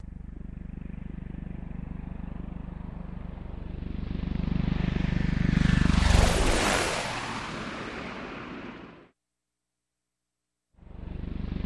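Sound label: Apache Fast By X5 Left Right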